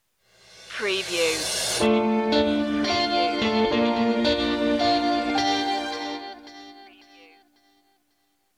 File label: pop presentation stinger